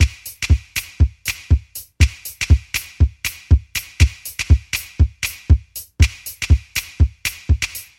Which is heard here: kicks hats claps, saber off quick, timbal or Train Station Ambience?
kicks hats claps